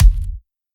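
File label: Kick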